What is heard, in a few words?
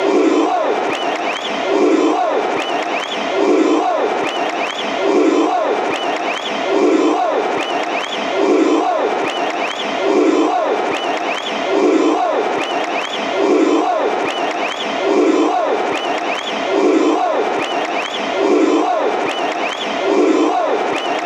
uruguay claps